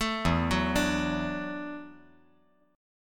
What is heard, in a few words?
E 7th Suspended 2nd Suspended 4th, down